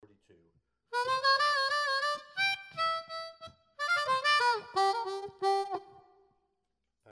Medium plate reverb

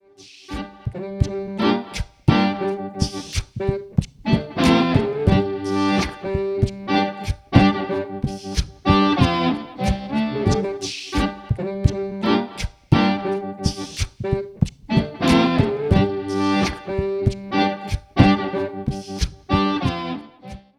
Big Horns

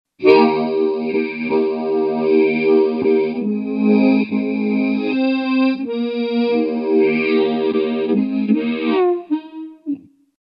Chorus Phase Flange Detune; DigitechRP; RP200

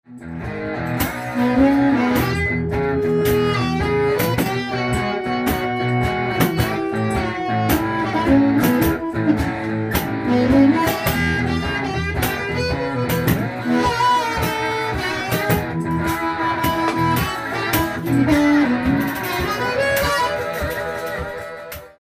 Fireball V

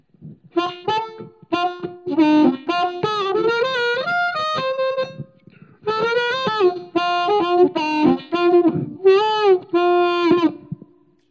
Vibro

